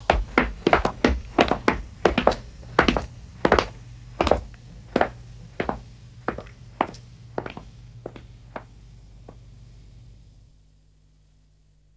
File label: medium tempo footsteps on stone stairs; foley